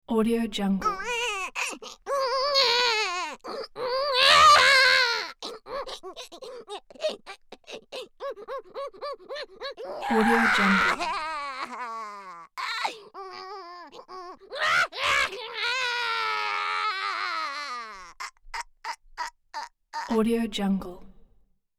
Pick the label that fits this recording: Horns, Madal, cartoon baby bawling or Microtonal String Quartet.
cartoon baby bawling